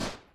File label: Minigun Shot